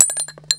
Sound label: ammo casings